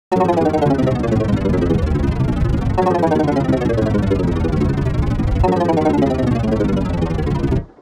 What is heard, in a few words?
descending seq; the analog sound waves